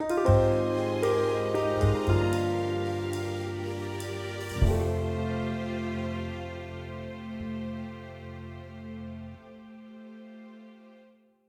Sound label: Level Lost